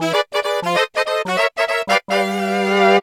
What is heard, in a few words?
Hurry Up, "Timer Warning"; SM3DW